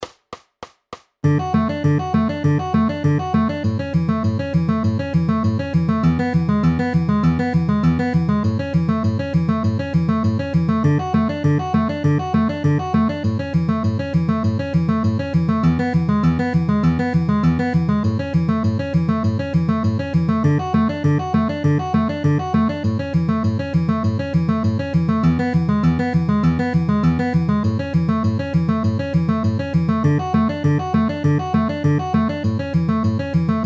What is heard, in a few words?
D A E A outer inner at 200 bpm